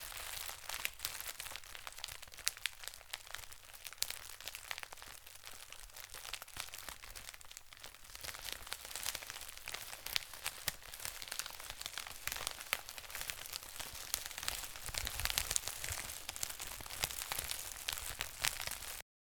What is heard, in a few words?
Fire Crackling